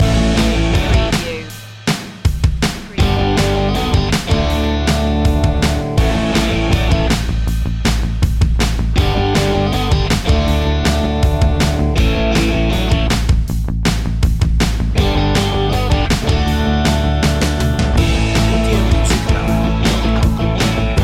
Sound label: moving forward variation